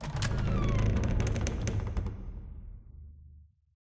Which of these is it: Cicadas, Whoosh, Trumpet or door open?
door open